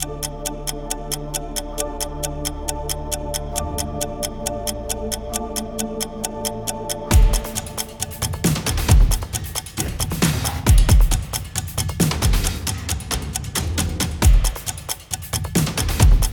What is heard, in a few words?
haber nostrings, news; By Burak Sound Bank; haberler news